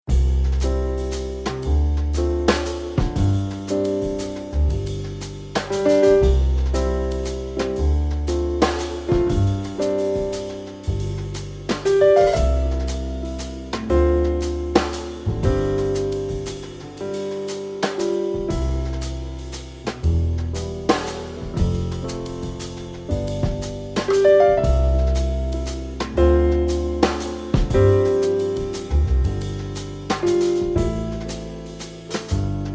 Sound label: Late Waltz